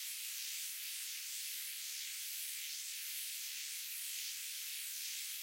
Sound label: Drag Electric